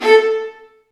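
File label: Marcato, Strings; Vlas Marcato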